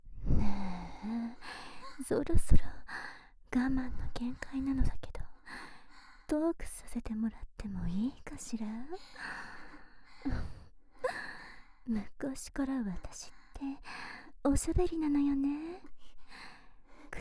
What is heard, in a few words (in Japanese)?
スパンキング